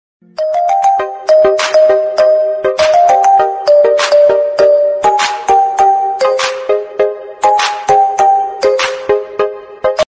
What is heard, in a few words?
Romantic ringtone